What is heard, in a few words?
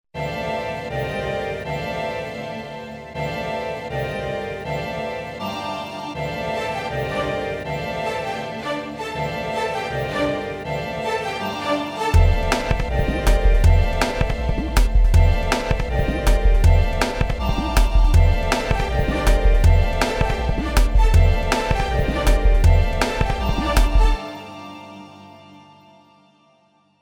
EDM 5057 Orbit Stereo Summed; Texture Red